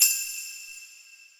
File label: whistle